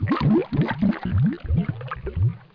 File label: underwater; dod